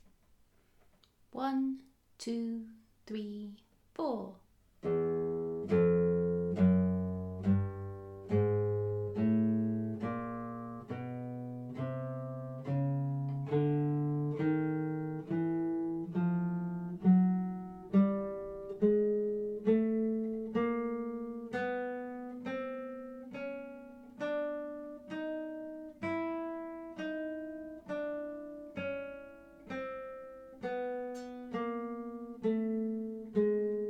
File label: e chromatic scale backing track; classical guitar